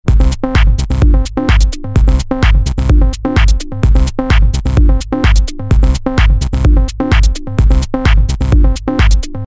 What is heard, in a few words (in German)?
Loop ohne alles